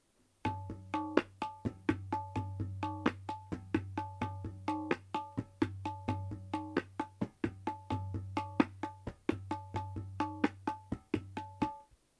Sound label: tabla